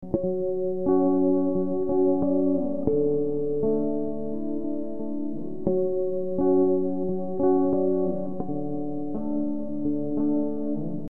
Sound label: bgm spooky alien